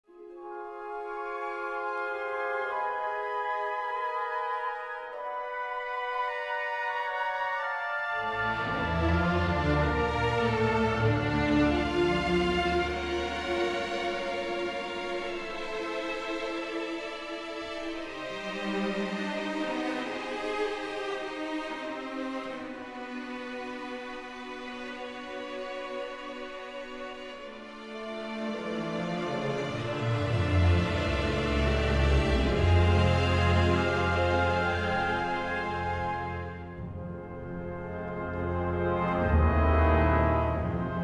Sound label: Loading Loop; Unknown Regions